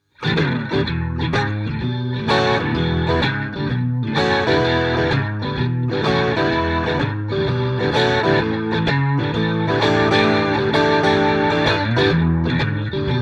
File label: X-VIBE ROTARY; xgear